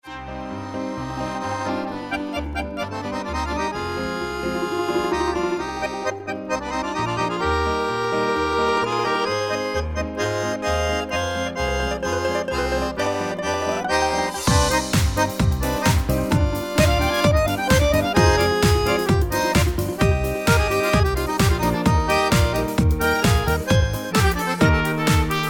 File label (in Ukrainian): Minusovka